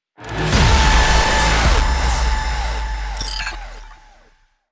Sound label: Achievement Completed; Custom UI Sounds; Cyberpunk 2077